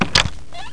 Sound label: DOOR OPEN; Atomic Structure 2